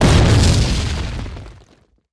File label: Explo Missile Rock Wall; Destruction